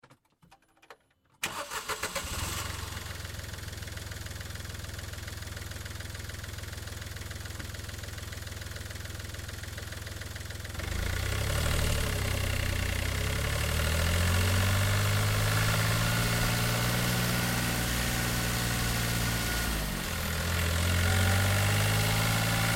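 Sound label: Car Smart Car Onboard Drive Med; 2005 Smartcar Fortwo; Vehicles; BigRoomSound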